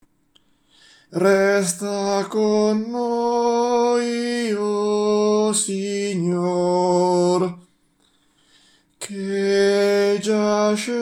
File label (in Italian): soprani; Resta con noi Bach